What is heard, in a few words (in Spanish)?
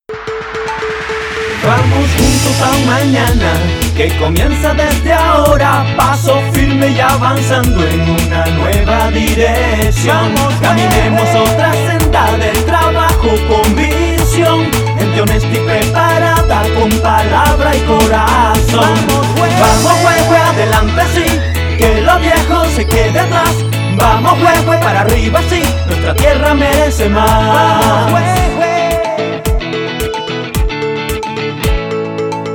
Jingle Sin Locutor; Vamos Huehue - Jingle Político